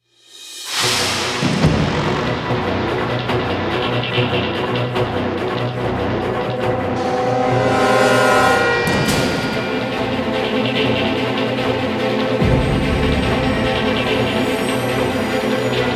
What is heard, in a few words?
Combat Dogfight; Elite Dangerous